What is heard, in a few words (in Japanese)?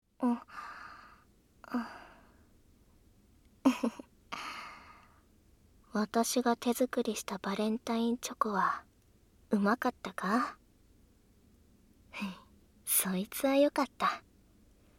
耳舐め 射精介助 声のみ ノイズ除去なし; メイドさん; 前半; 反転